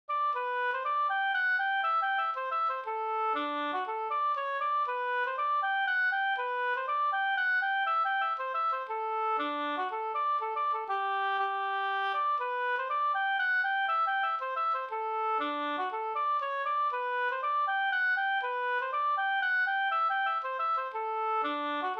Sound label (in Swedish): polska; e Olof Ronnqvist Jm